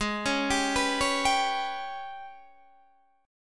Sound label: G sharp Minor Major 7th Double Flat 5th, Guitar DADGAD; 18,16,17,16,16,17